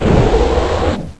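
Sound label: platform start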